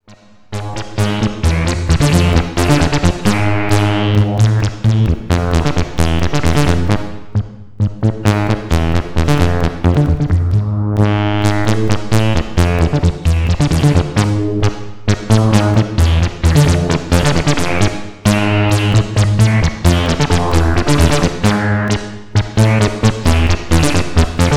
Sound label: bassline